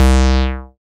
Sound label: SYN TB SUB, TB-303; Sound & Vision - Gigapack I CD 2